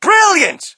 crowd, shout; Mike Tyson Heavyweight Boxing

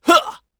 throw; UFC Tapout; XBOX

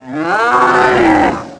Idle Roar, Ice Beast, Aliens

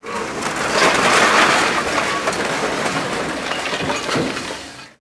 scrap metal falling; Max Payne; cold steel

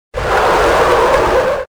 tire squeal; Soldier Of Fortune II Double Helix; XBOX; ambience

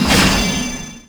sk8 jump gap; Tony Hawk's Project 8; XBOX

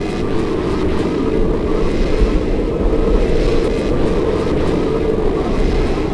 ambient

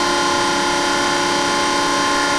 machine paint servo loop

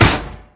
trash can lid impact

